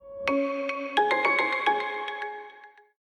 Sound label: Power On; Samsung; Z3; keysound